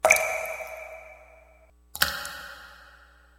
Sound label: water drain